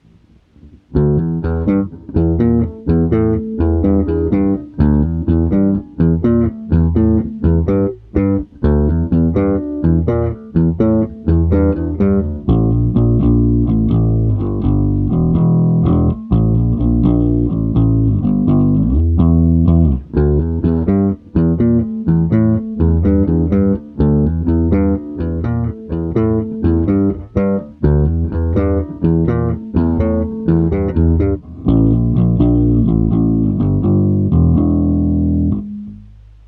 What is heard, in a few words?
bass